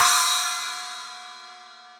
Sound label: SPLASH edge crash, Cymbal; Ableton; Factory Packs; Drum Booth